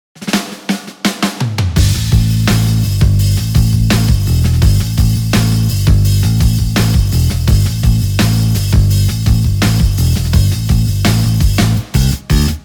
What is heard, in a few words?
classic pick bass